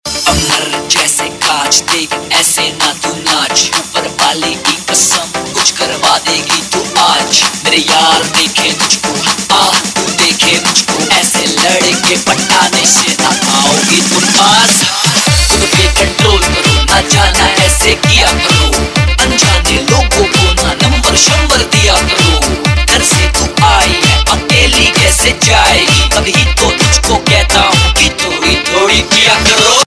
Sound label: Remix; Punjabi Pop Ringtone